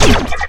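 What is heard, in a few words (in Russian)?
маломощный лазерный удар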